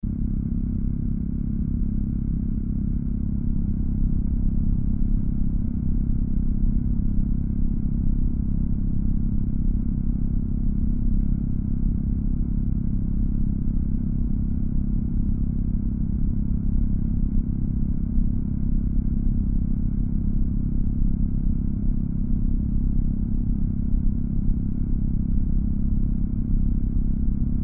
transformer control room

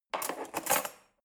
cutlery fork drawer handle scrape soft

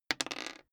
die roll table hard

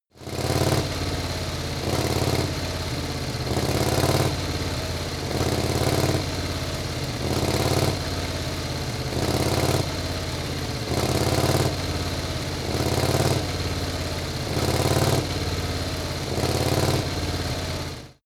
motorcycle harley engine rev roar sequences

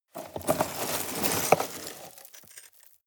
scrape rocks medium

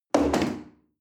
chair wood place hard

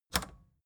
record player power click on fast

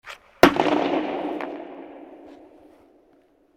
board shot low

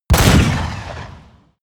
ballista fire hard

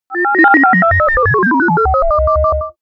telegraphic synth

